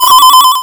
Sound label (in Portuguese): trim alerta efeito sonoro